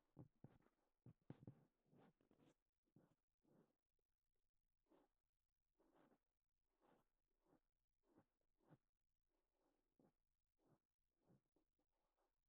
竹耳かき 外 L; KU100

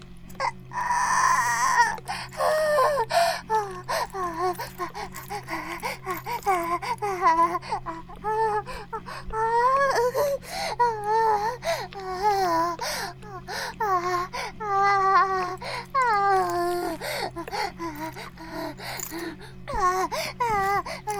バイブ放置; イクたびにわけて

